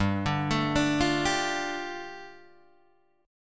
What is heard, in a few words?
G 7th Suspended 2nd, Guitar DADGAD